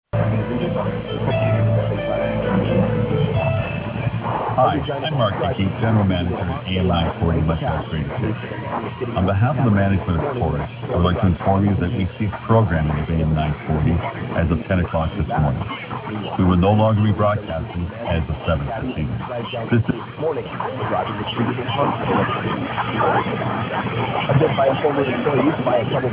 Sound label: am 940 silence